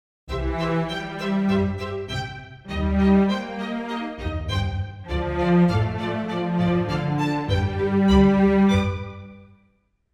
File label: BBC Strings Main und Leader; Spitfire Audio